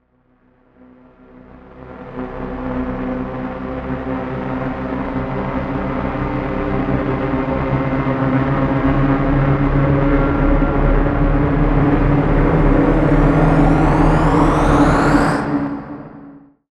Riser

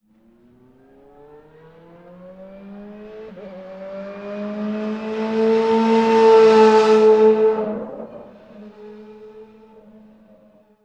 Race Car Fast By; Vehicles; Cars & Trucks

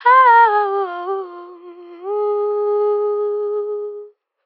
Ad Lib Dry - F Min; Vocals; Cymatics - Eternity Sample Pack; Ad Libs

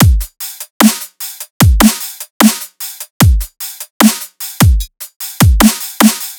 Dubstep Drum Loop Full, 150bpm; Stem Seperated

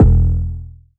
808 E, Drums One Shots; 808s; Cymatics; Cymatics - 9God Hip Hop Sample Pack

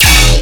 Bass Hit D#, Dubstep; Abyssal Rumble; Ghosthack; Demolition

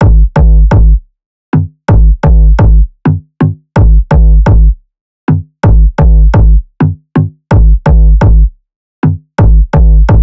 Drum Loop 128 BPM Kick, Experimental; Drum Loops; Loop Stems; Abnormal; Cymatics; GHOST (Beta Pack)

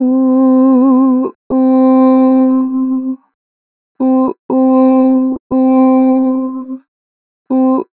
Ooo, Harmor Vocals; 4 bar flat; Pitch Flat

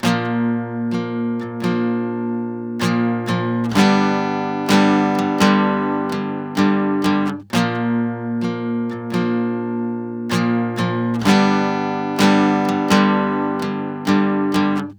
Guitar 128 BPM Gmin, Loops; Ghosthack; Ghosthack Advent Calendar 2021; Overdose

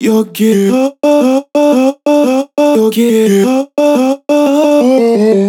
Vocal Loop 175 G; Vocals; Advent Calendar 2019 Day 23 - Vocal Loops

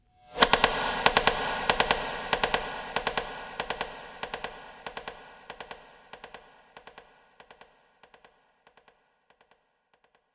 Conga Bounce 142 BPM, Ethno, Psytrance; Ethno Box; Conga Bounce Reclections

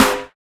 Snare, Dubstep Snares

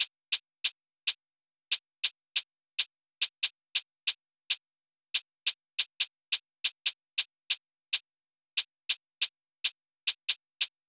Hihat Loop - 140 BPM; Drum Loops

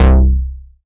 Moog Model D BASS Tech - C; Synth; One Shots; Cymatics; Fantasy Synth Sample Pack

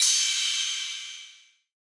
Drum Loop, 170 BPM Crash; Cymatics; Anthem Drum Loop

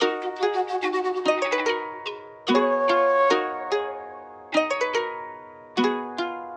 146 BPM D# Min; Gems Vol 8; Mirrors; Cymatics; Gems Free Teaser Pack

